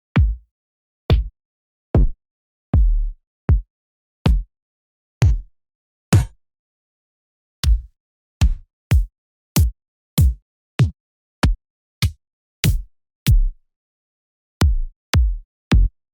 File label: Kicks; Chemical